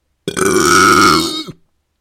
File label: burp